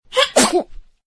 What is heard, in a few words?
cute sneeze